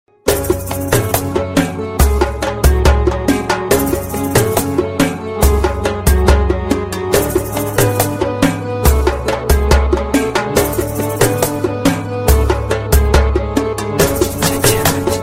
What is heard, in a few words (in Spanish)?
arab tambores castellano tono de llamada